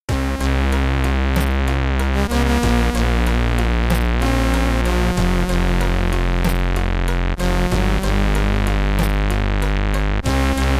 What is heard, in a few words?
Game Over SID Stereo; Adrenalin; Commodore 64